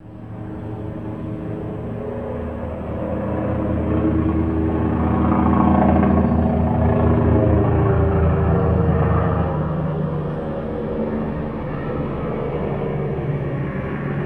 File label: Helicopter; Sennheiser Ambeo Orbit